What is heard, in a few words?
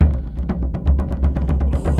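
Music Shield; from dust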